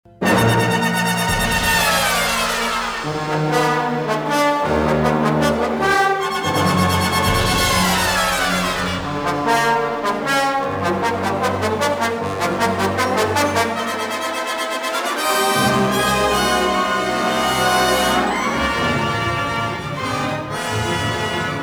action; Star Wars Jedi Knight II Jedi Outcast; XBOX; yavin courtyard

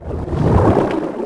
Swim; Finding Nemo; XBOX

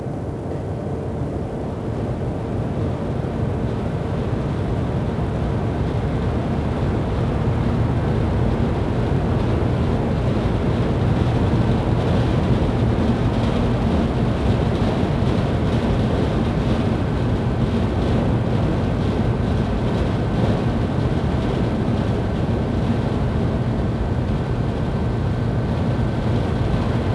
Steelmill Ambience; noise; Max Payne; XBOX

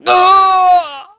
death; Pulse Racer

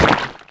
Whale Splat; Finding Nemo; XBOX

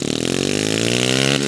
low, Engine

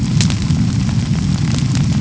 Fire Weed; Ambient; MX vs ATV Unleashed

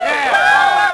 solo; WhiteOut; XBOX; tundra

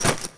Webbing; Player; Footsteps

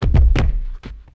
bodyfall; characters; death

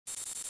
fuelpump

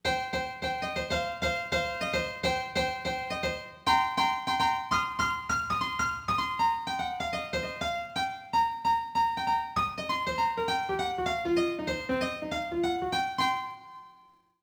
piano; Matt Hoffman's Pro BMX 2; XBOX

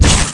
Bullet Shield, Impacts

